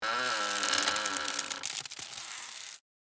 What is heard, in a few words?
Hamper lid open creak; basket